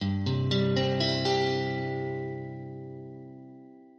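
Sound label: Cmaj, Guitar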